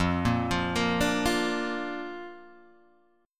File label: D Minor Sharp 5th F; arpeggiated